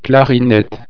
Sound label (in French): clarinette